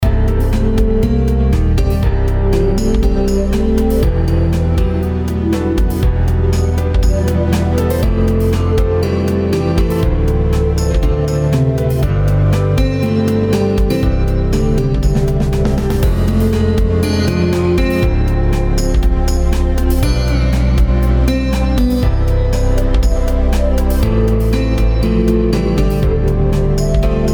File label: piano